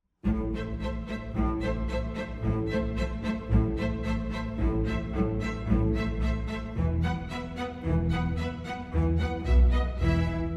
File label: piano